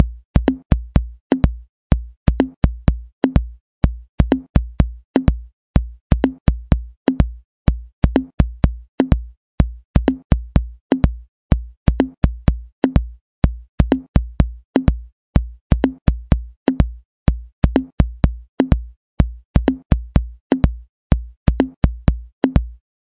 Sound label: Optimisim Mambo